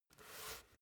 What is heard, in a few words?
basketball turn swipe long; sport freizeit